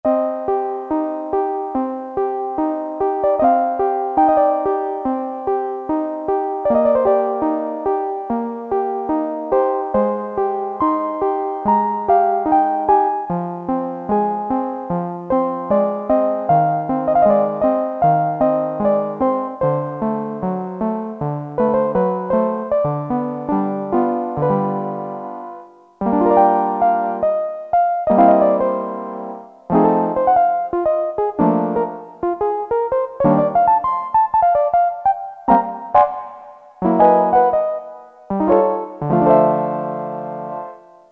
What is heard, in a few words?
Piano, Farfisa Syntorchestra, Poli